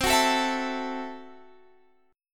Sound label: C 6th Flat 5th, C